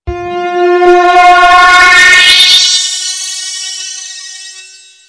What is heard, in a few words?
lawnmower